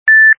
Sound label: Beep; Nokia; 6708